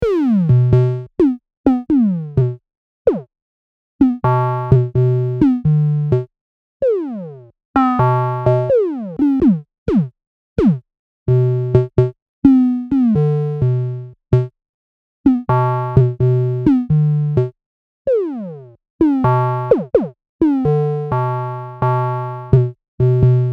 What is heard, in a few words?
rototom; ultrarare